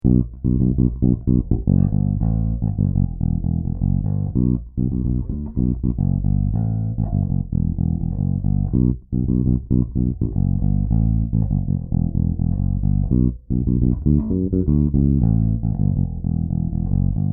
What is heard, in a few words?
Passif Groove Tona Off, basses; Sadowsky UV60